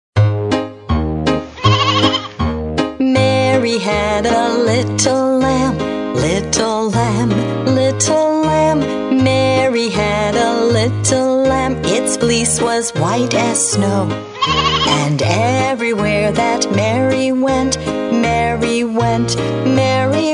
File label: Mary had a Little Lamb Nursery Rhyme